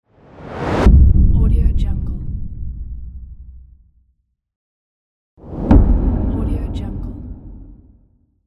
cinematic whoosh impact

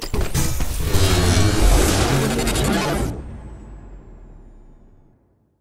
transforming

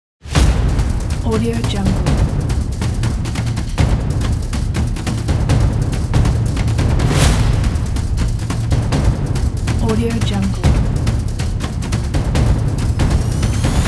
epic percussion

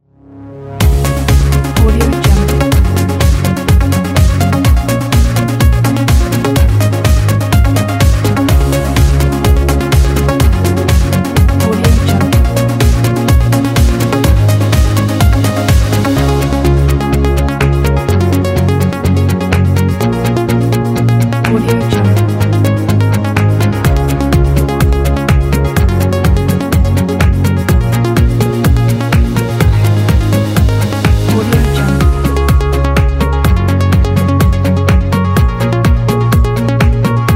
motivational

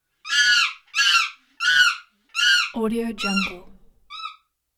chimpanzee screams

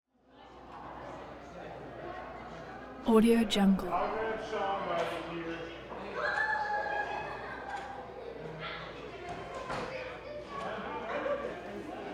crowd walla wedding ballroom; prosoundfx

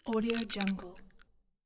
eating carrot

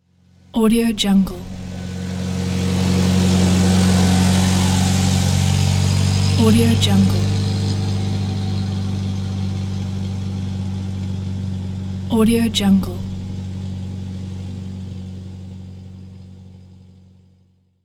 truck passes by; wistansound

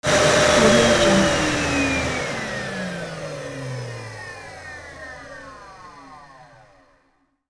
power down

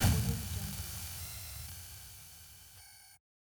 scifi glitchy digital explosion; stormwaveaudio

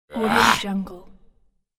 awful creature disgust voice

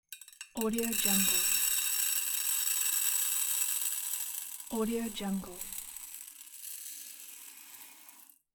pour cereal into bowl

